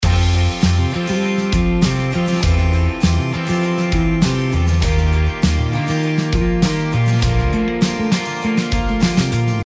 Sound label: a guitar attack